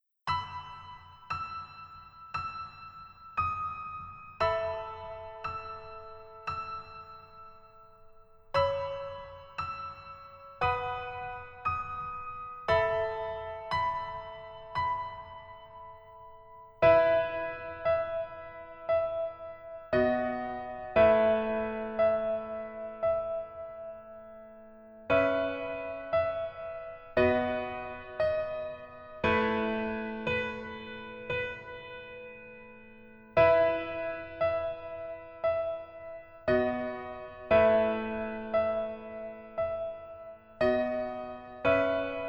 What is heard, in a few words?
Piano ver